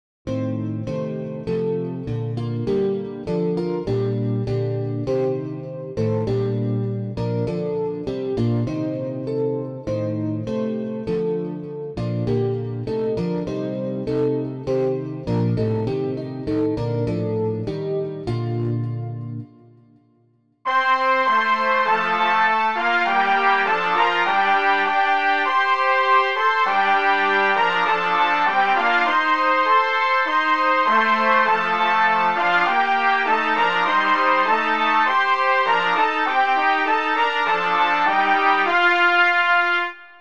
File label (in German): christfest chor